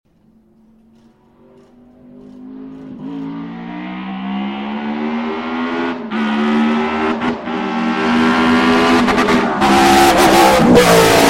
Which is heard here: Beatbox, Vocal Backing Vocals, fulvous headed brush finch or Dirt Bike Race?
Dirt Bike Race